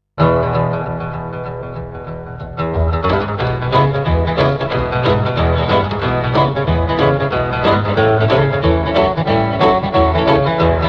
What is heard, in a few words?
Guitar Boogie